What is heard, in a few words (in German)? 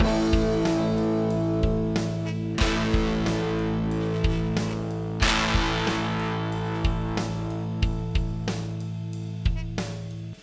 Donner Extrem Drive Wet; Gitarre